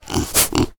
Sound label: little pig